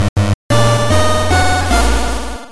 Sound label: Minigame Loss; Shantae; GB